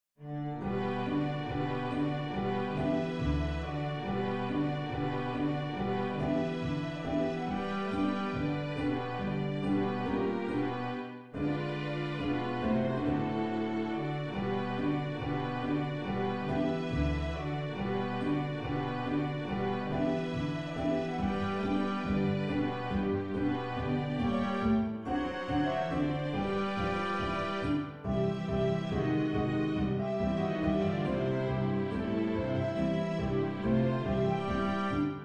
ragtime

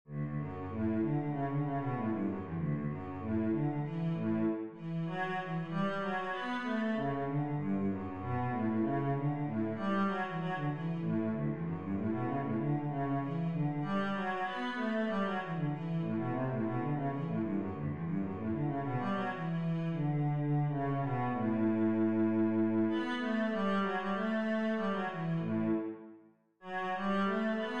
Brandenburg cello

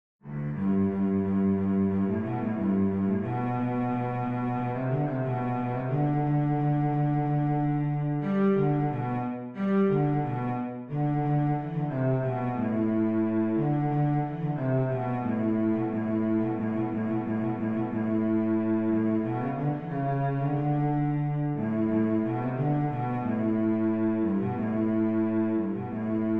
cello melody; rejouissance

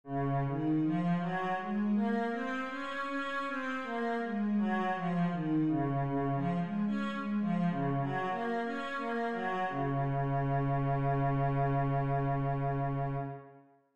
d flat major, scales, cello

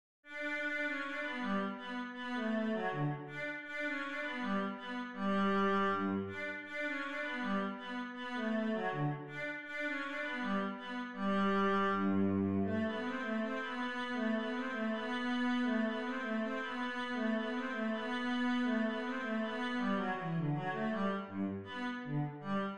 halling cello

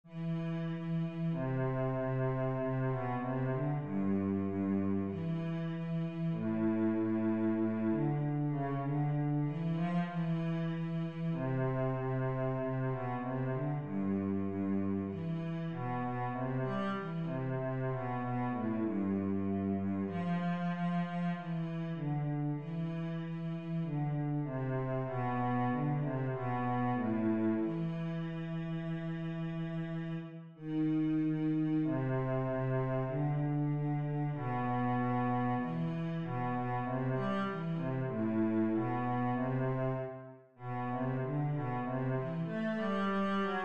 andante cello; rosamunde

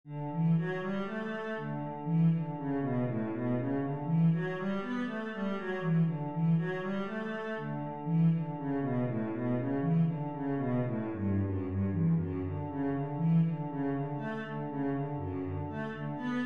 etude, cello

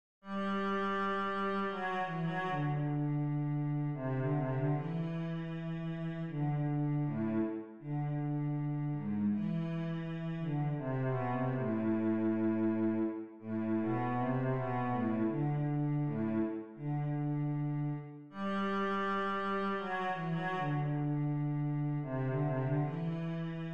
nocturne cello

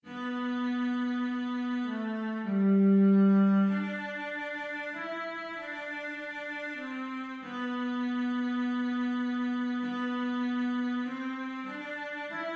cello

